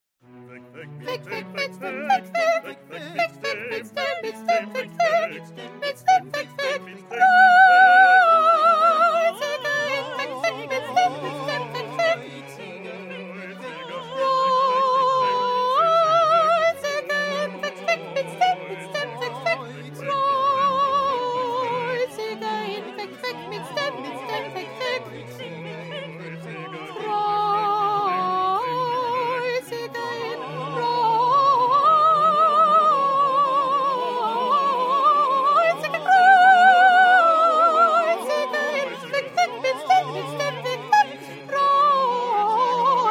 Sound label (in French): PETIT CHOEUR SOPRANES